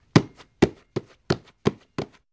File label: Football Handling